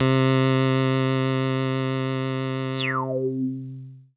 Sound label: SYNTH Simple Thin Square Filter Slope